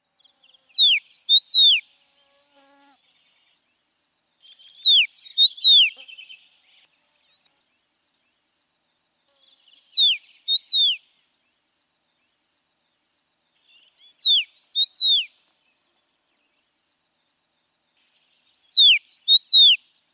punavarpunen

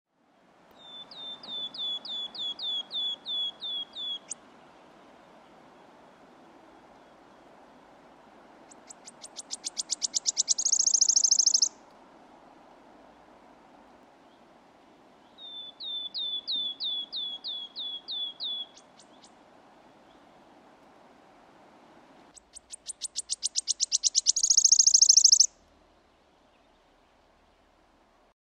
sirittaja

